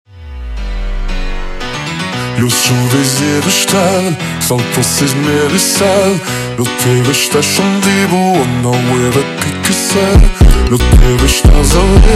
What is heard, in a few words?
Batida slowed